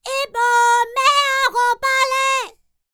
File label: Vocal Planet World Voices, NOMADIC; ILIO; Vocal Planet VOL-5